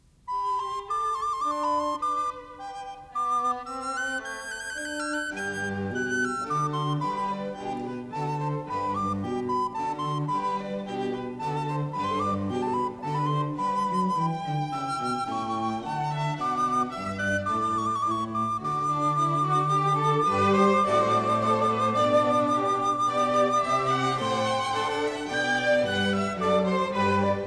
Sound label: Recorder Concerto; Handel; Op3-3; Marriner